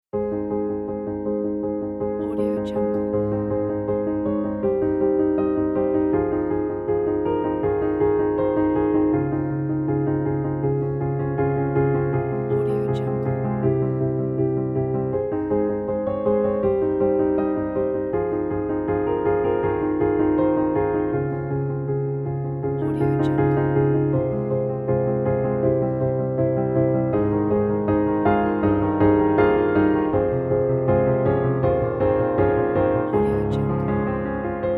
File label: joyful piano